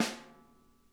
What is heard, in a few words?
SNARE